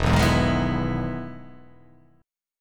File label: E Major 7th E down